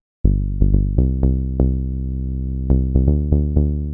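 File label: 122 BPM Bass Synth A major G minor Tonic C; Pro Bass Synth Studio Kit; MMB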